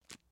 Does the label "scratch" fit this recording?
Yes